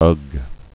ugh

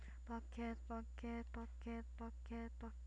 bucket

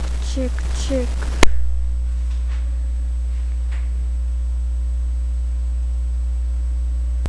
chick

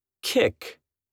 kick

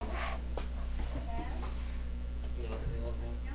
noise

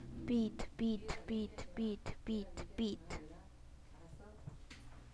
beat

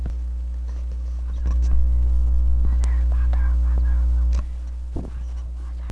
bottle

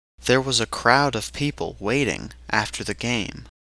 crowd

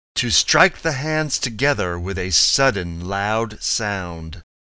clap

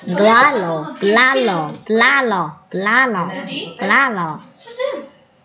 laugh

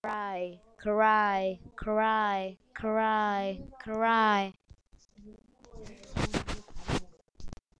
cry

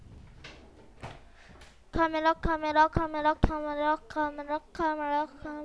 camera

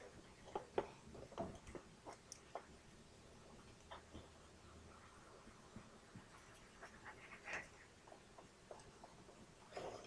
cry

